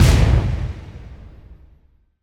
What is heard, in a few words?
Impact Boom Crash; bottlerocket